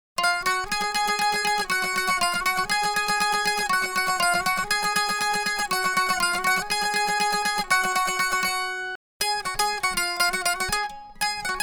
mandolin, A stringed musical instrument shaped like a almond